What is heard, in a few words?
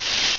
SCRAPE